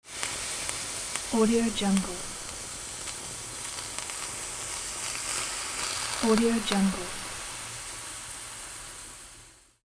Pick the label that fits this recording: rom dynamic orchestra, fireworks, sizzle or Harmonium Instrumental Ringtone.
sizzle